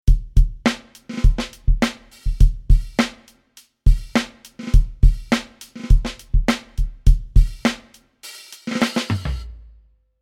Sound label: Kit, Drums